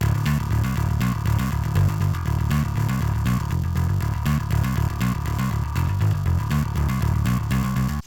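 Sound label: wet bass, Multiband neural audio effect; prism distortion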